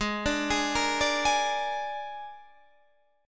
Ab Suspended 2nd Flat 5th Ab, Guitar Standard; arpeggiated; JGuitar

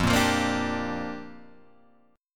A sharp 6th F

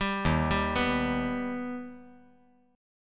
Eb Augmented Eb; arpeggiated; JGuitar

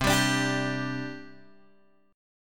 C Suspended 2nd C Guitar Open G

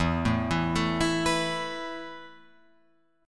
E Suspended 4th E, down, Guitar, Open F; Esus4 arpeggiated

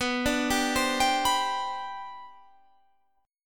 G Major Add 11th, B, Gadd11/B chord; arpeggiated

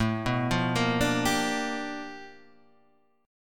G 7th Sharp 9th A chord; G7#9

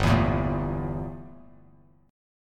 G Minor 6th Add 9th G down Bass Standard; Gm6add9 strummed; JGuitar

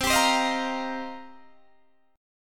C Minor 11th C Guitar DADGAD; Cm11 strummed; 22,22,22,20,20,20

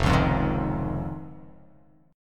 F Minor 7th Sharp 5th

